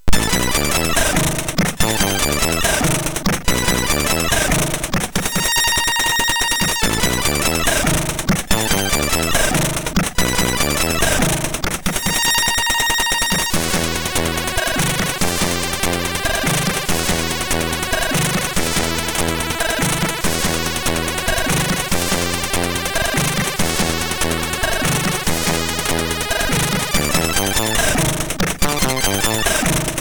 sid MOS6581R3; Ninja Spirit; Walker Martin

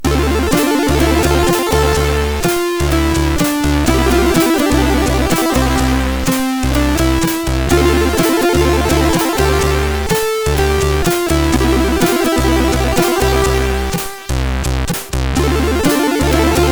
Jazz, MOS 6581; sid; MOS6581R3

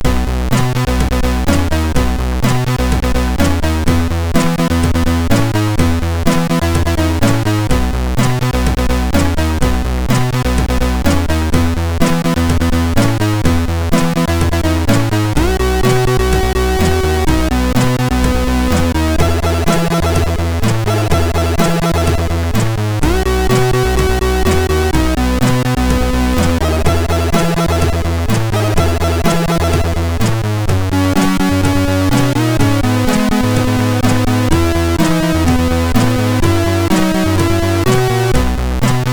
sid MOS6581R4

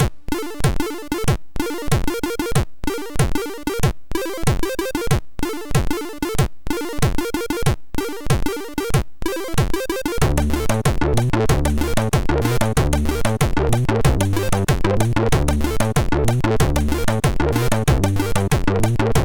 Dump, MOS6581R4

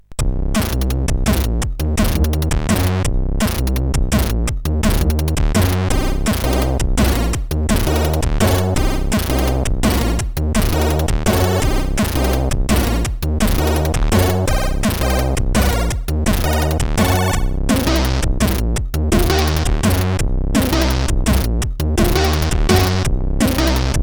DNB, sid, CSG8580R5; LEK

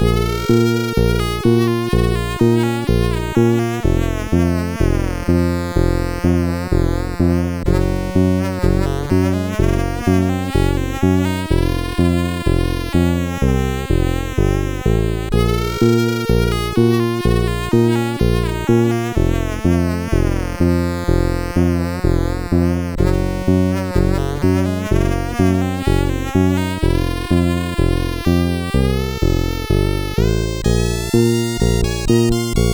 Creepy Tune, sid CSG8580R5

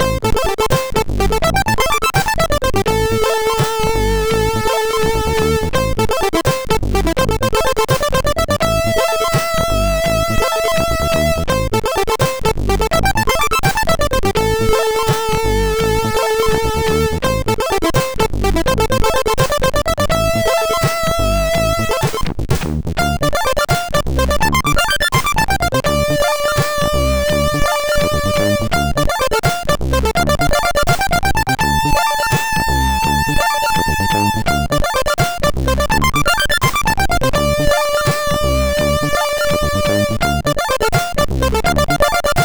Pumas Run 2 voices, MOS 6581 SID

